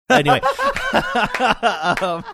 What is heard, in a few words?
laughing saying anyway